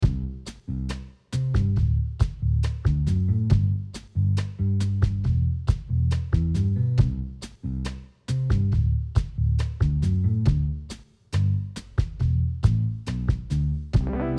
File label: Lo-Fi